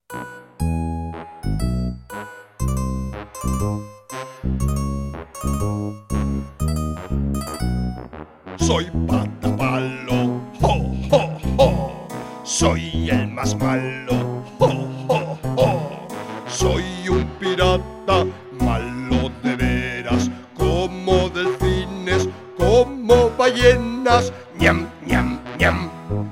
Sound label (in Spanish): Canción de Patapalo cantada